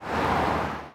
Woosh; Cars; XBOX

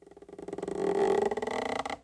Wood Creak, ambience; Star Wars Obi Wan; XBOX

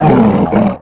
hit; tusken King; Star Wars Obi Wan; XBOX

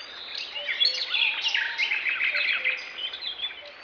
Birdsong Loop

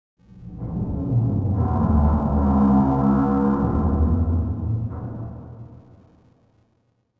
metal stress, cargoliner; Soldier Of Fortune II Double Helix; XBOX; ambience

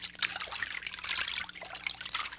fountain; Cars; XBOX; Ambient

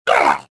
pain

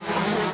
fly buzz multi

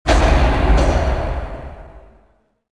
block stop; movers objects

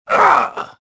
choke

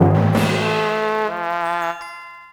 Hit, Music

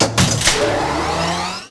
lab door

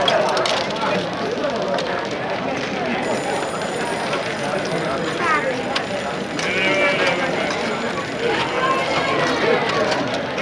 casino; lasvegas

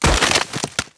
deadfall grass

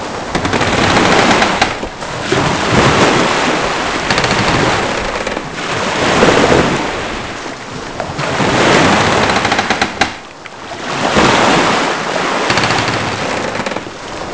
boat waves; The Elder Scrolls III Morrowind GOTY; XBOX

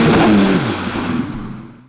stall, Engine; Splashdown; XBOX

